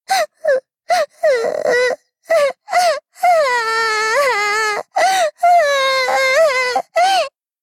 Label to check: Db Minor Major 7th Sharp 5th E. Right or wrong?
Wrong